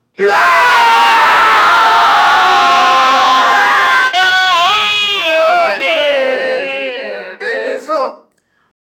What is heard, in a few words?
a live audience yelling t, booing